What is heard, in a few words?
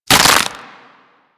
Stealth Neck Snap; Warframe; Characters; Tenno